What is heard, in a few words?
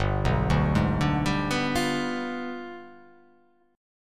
G 7th Flat 9th